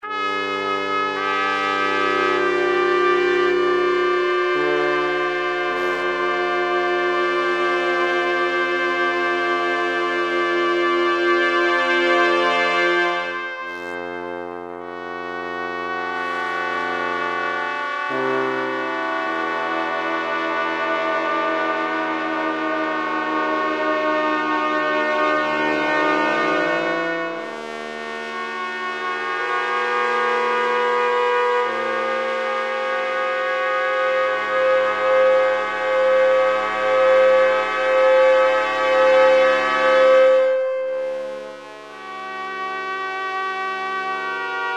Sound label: Trumpet Song